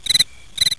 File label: Birds; de junglecamp